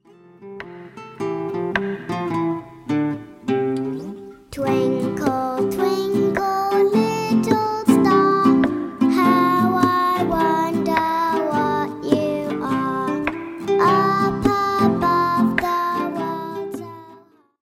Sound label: Twinkle